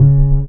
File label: BAS TON; E-Lab; X Static Goldmine 2; akai